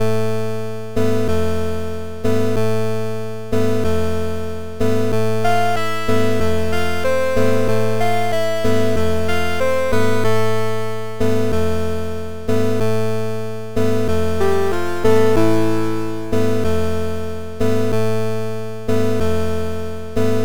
funeral, chiptune

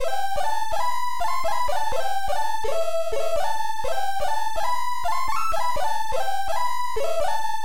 cheerful, chiptune

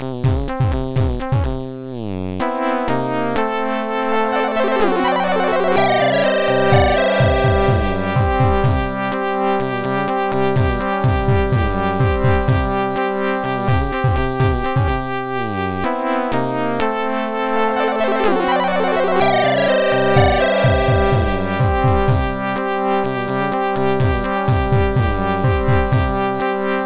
shiptune, chiptune

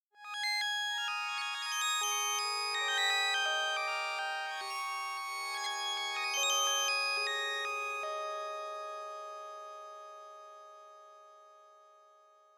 reaktor

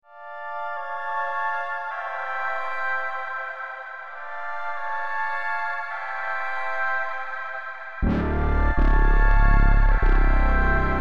oxygen old bass

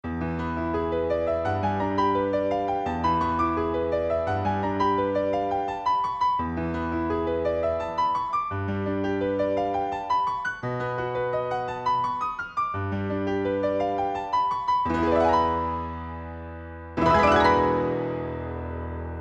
piano harmonics

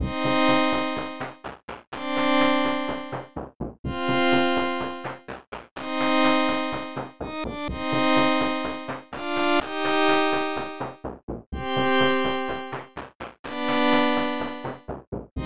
chiptune

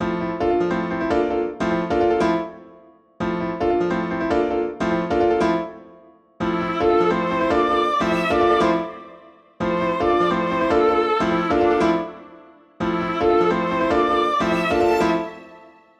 7 chords

